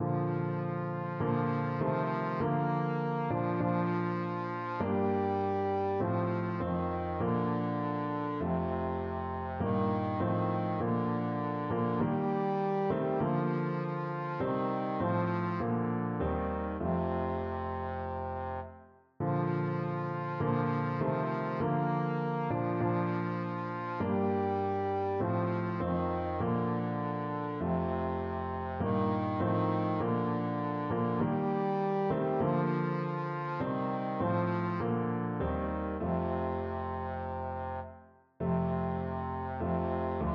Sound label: Trombone